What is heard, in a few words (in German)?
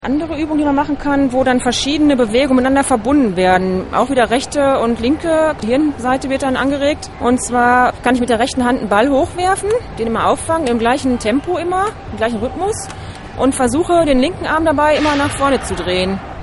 drehen und werfen